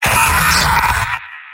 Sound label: soldier pain severe; vo; tf2; mvm